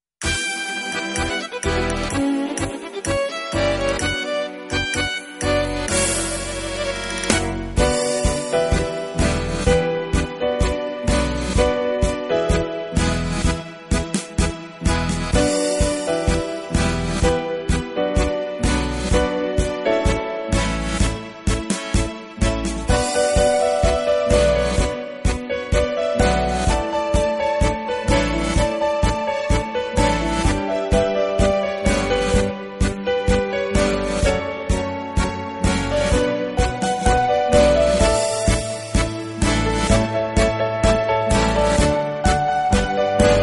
tango

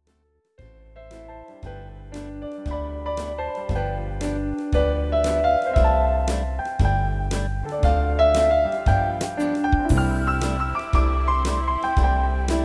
FOXTROT

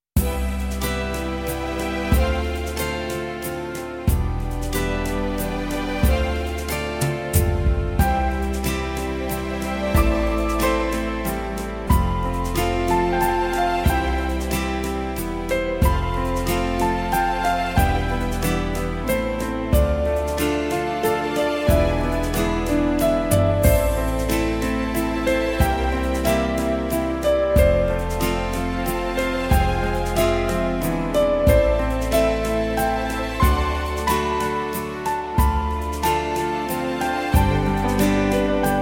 WALTZ

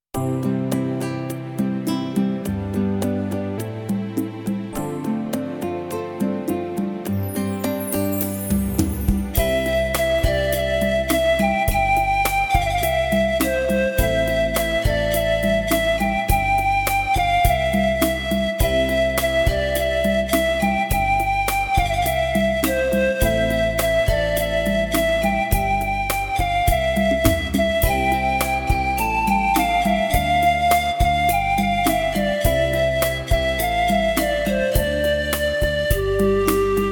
rumba

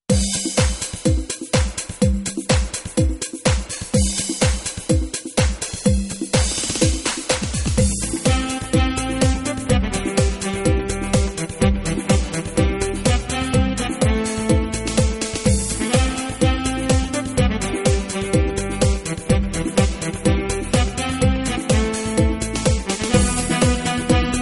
cha cha

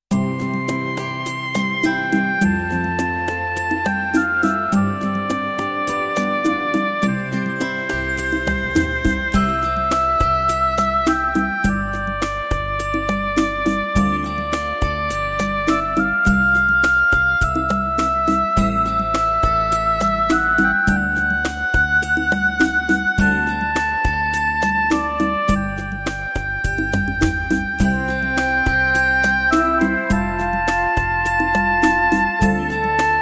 rumba

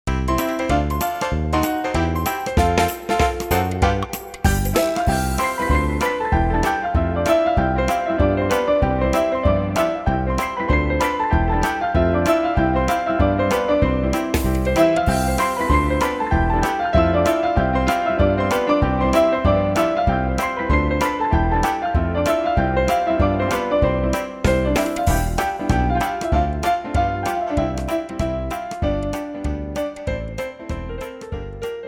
SWING

